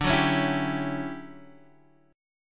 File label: D Major 11th Guitar Standard, chord; strummed; JGuitar